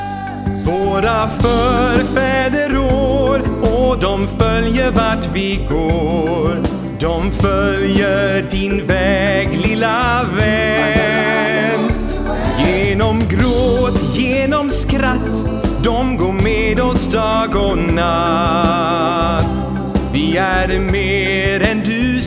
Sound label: male swedish singing